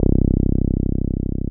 TB-303

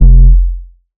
Bass F, Bass Synth; Instrument Oneshots; 99Sounds; Monosounds

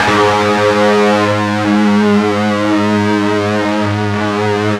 FUZZ G#1, Guitar; East West; Steve Stevens Guitar Samples Collection

